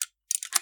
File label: Unlock Sound